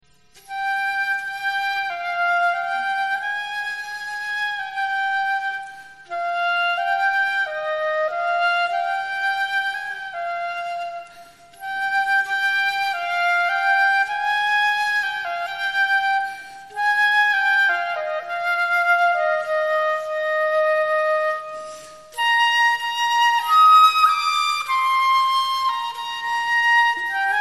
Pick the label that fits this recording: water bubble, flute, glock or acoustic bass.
flute